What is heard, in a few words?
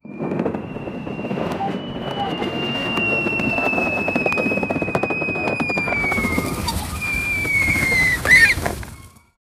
chopper blades thundering; sled